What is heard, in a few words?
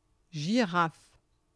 giraffe; FoodChain; fr